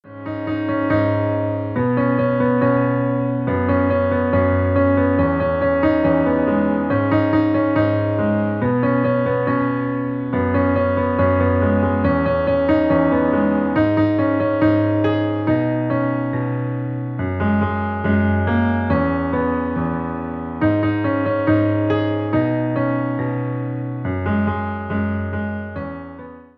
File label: piano cover